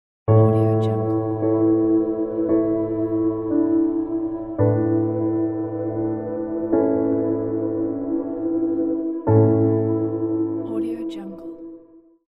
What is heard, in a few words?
cathedral logo; hymnus